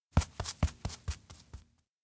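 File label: steps outdoor main street burst out; jet; anemoi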